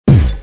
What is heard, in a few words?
button